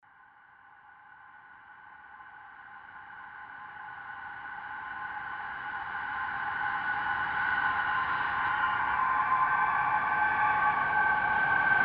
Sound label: reverse slow motion atmo